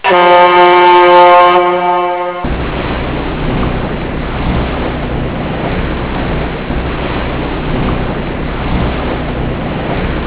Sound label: boat; angelsNdogs; getaway